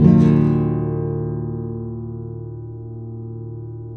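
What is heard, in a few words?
nylon guitar down minor E, flam chords; Spectrasonics; Hans Zimmer Guitars VOL-2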